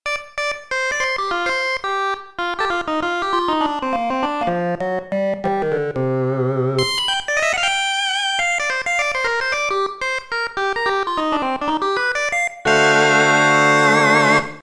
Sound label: sync solo